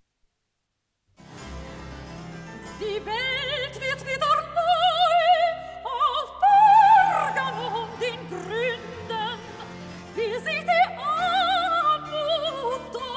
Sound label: Recitative; Die Welt wird wieder